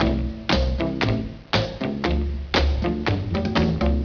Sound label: melody